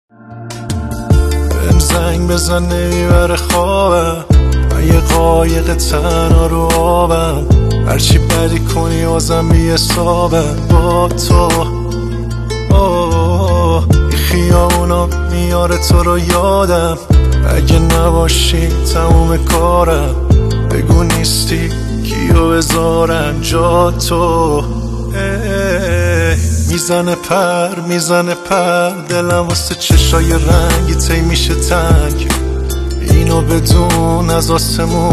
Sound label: bass